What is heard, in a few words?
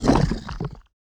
seedfrog damgup, monster